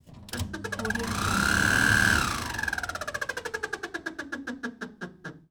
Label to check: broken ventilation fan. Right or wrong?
Right